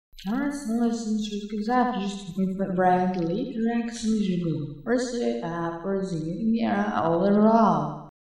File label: Sound Alien